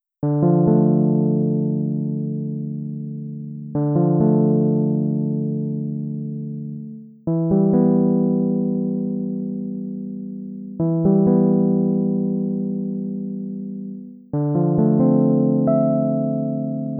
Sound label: Instrument